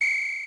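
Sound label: Samba Whistle Low, Yamaha DD-35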